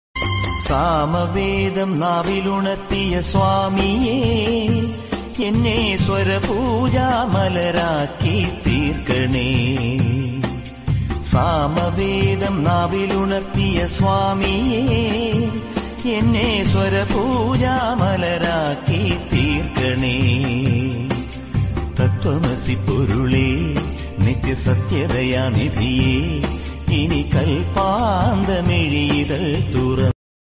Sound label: malayalam devotional ringtone bgm